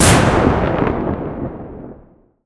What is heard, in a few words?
SHOCK RIFLE Clap Thunder Tail